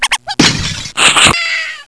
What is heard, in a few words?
DOGSOUND